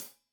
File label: Hat Tight, Hi-Hat, Cymbals; Deeply Sampled Drums